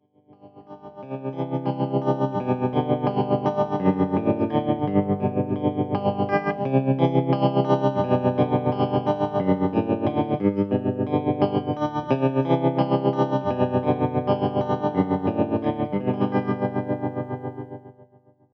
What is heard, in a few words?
trm high speed